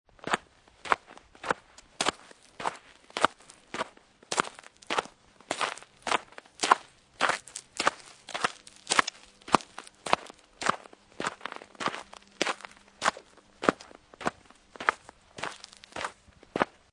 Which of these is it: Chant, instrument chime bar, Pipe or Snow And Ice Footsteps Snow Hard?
Snow And Ice Footsteps Snow Hard